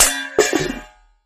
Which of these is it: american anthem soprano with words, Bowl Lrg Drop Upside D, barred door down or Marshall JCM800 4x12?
Bowl Lrg Drop Upside D